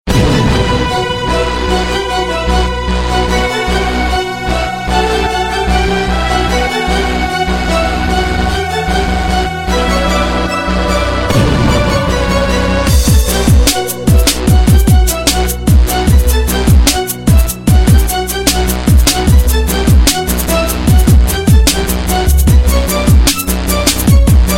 Hip Hop Beat

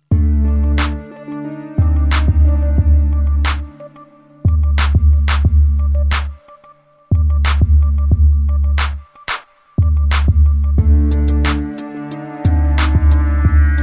Beat

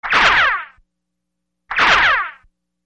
Cartoon Ricochet

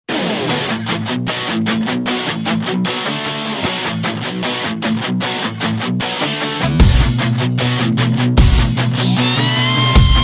Guitar Mix

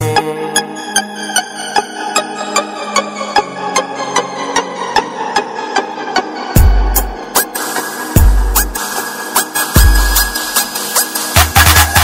Mola ali beat

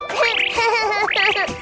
giggles

